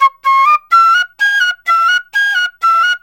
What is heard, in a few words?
SERULING, Heart of Asia